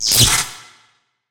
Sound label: Cable Filling; Prison Architect